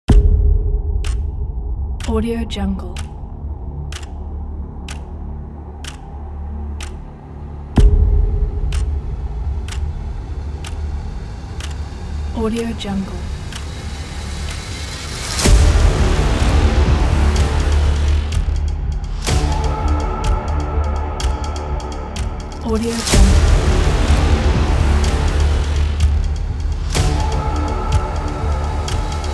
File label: countdown teaser